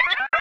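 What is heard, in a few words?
sphere blips medium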